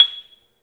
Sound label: MARIMBA; 3D Sound; 3D Keys VOL-1